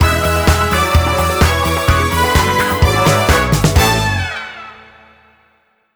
DISCO; Buzz! Junior Dino Den; PS2

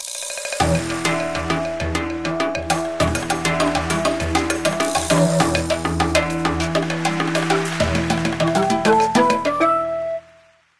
medium tasks completed; Karamja

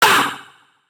cowboy pain

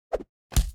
Tactics Baton

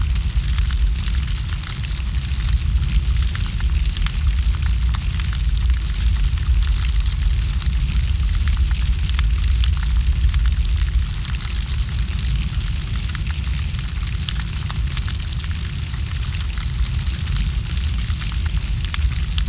environment campfire

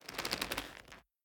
Leather Foley; The Town

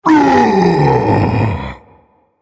Vocal Colossus Efforts Heavy

